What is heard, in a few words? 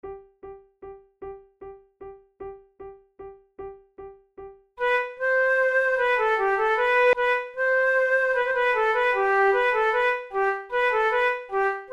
menuet en muzette, flute